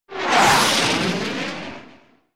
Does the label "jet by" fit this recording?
Yes